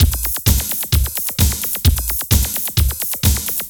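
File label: drum loops, right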